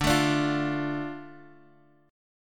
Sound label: D Minor D Guitar Open G